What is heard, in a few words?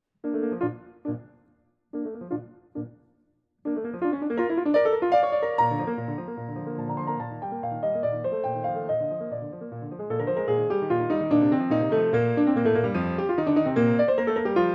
Spot Mike only, Grand Piano; Beethoven